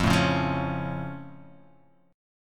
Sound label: F Augmented F down